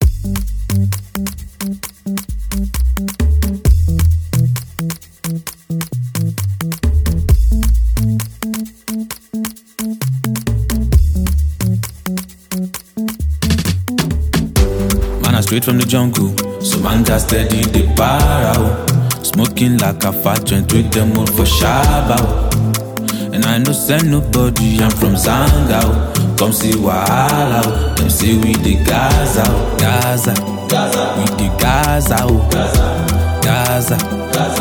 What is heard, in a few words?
Open Verse Instrumental; Gaza